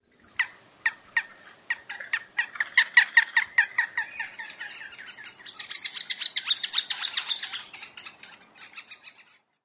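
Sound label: interaction calls, bird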